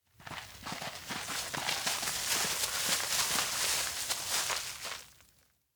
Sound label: steps outdoor wheatfield arrive kids multi; anemoi